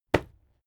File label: fight impact sweetener bright; krieg-waffen; nahkampf; soundpool